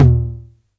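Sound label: 909 low tom tape; Toms; Goldbaby Tape808 & Tape909; The Tape 909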